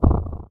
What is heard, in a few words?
crumble; watertowerclassic